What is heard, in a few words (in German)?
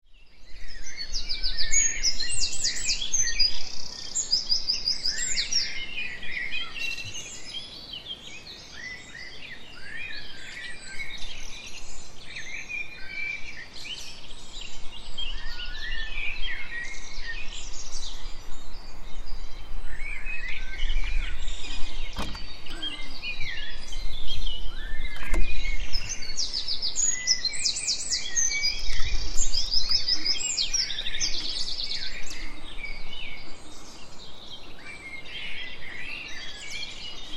Geräusch Vogelstimmen 6 Uhr